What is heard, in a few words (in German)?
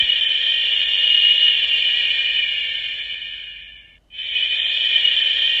Stille